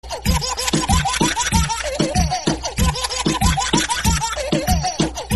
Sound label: baby laugh remix